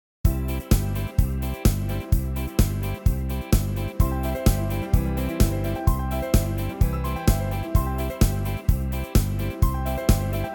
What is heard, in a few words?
PAD